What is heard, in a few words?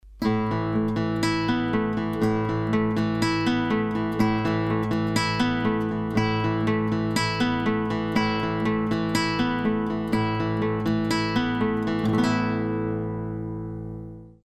Guitar Comp EQ